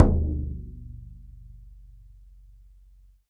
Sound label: BODHRAN